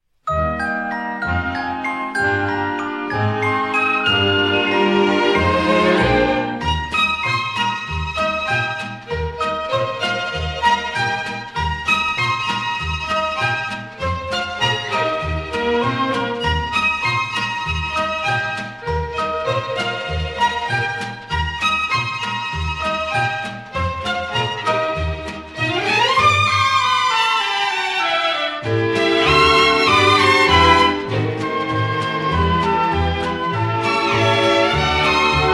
Concert Orchestra

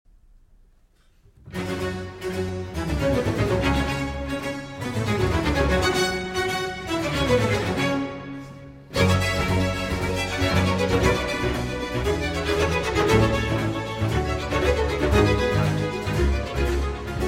Cello Concerto in G Minor, Allegro

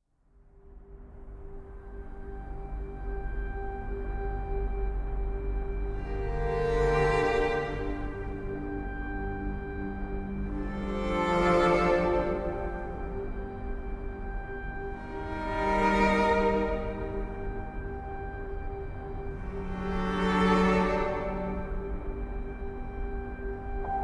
Piano Version

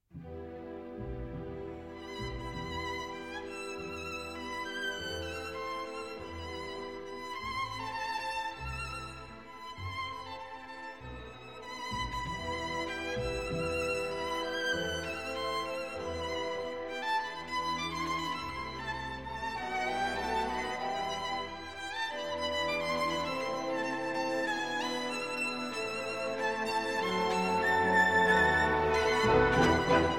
Concerto in E Minor for Violin and Orchestra

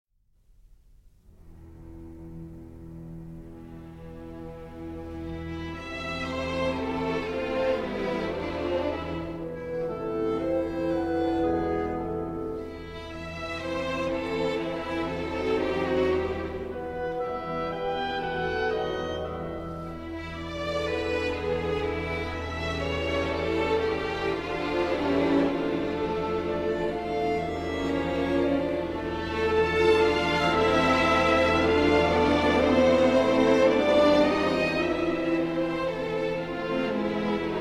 Fantasy in C Major for Violin and Orchestra

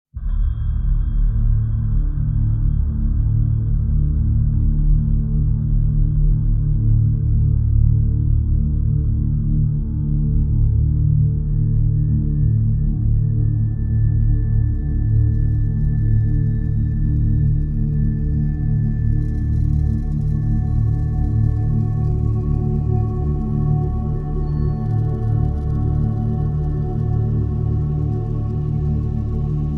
Piano Version

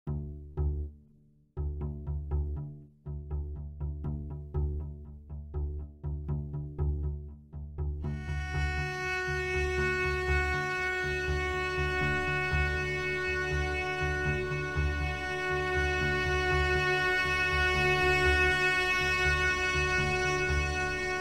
Ambient

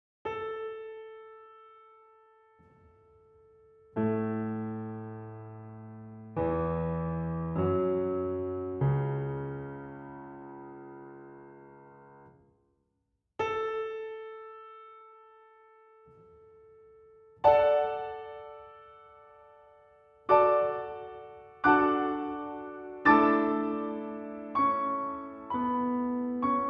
Solo Piano, Overture; Rienzi, Der Letzte Der Tribunen

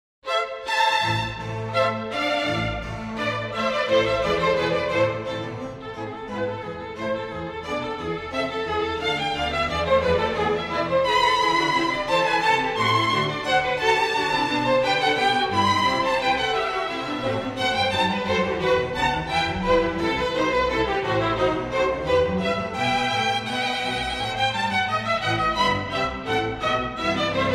Violin Concerto in A Minor; Bach, JS; Violin Concerto No. 1; BWV 1041

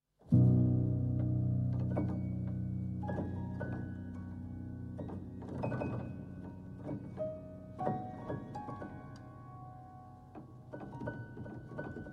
Piano Reworks

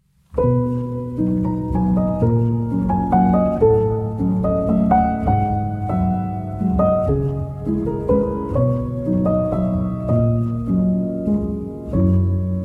Waltz in B

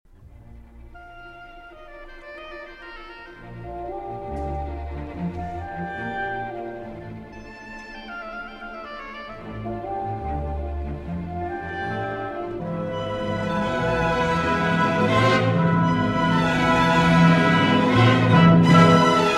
Cello Concerto in A Minor, Allegro Non Troppo; Saint-Saëns; No. 1; Op. 33

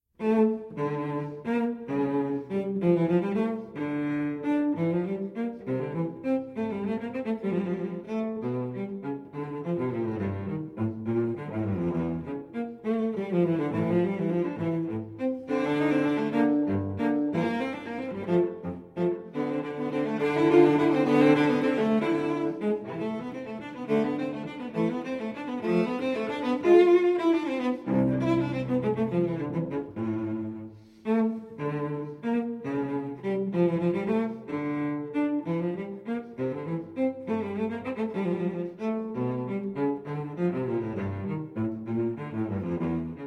Cello Suite in D Minor Gigue; Cello Suite No. 2 in D Minor, BWV 1008; VI. Gigue

